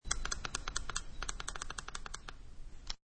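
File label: Electric Button Push, Pushing quickly up and down on an flashlight button; Part of Flashlights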